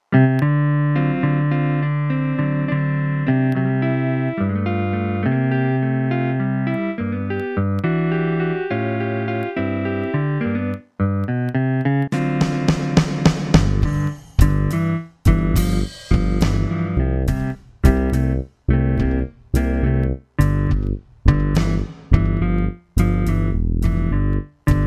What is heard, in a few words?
Blues